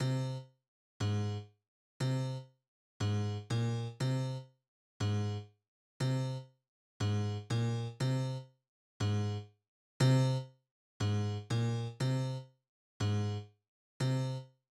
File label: Roland SC-88; SimCity 2000